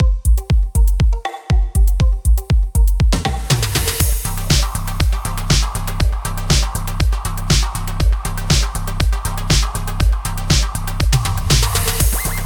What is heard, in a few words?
beat; Magic GameClient